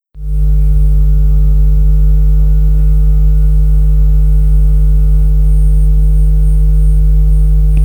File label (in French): Bruit